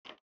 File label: type; zara